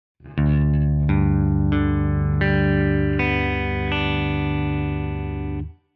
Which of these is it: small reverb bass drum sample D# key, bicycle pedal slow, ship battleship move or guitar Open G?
guitar Open G